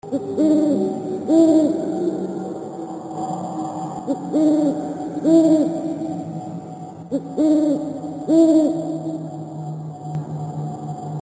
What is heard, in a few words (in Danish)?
Uhyggelig ugle; ringetoner